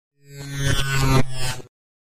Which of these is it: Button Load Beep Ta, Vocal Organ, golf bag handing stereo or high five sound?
Button Load Beep Ta